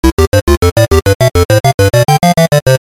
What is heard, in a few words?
video game music level complete